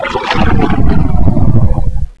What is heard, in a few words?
blast